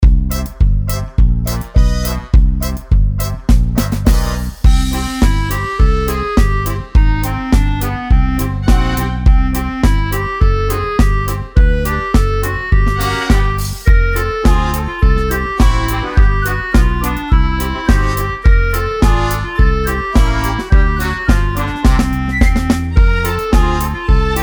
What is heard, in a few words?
karaoke